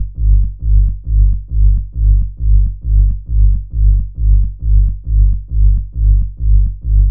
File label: Bass Loop G 135, Techno; Ghosthack; AC2024 - Day 1 - Techno Sounds 2025